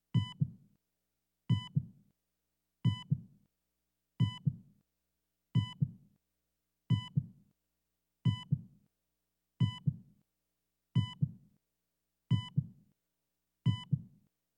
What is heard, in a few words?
heartbeat monitor slow; envato